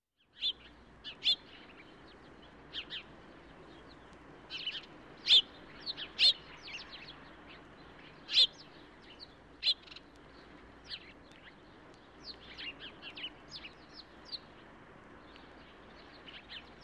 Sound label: Birds chirping with distant river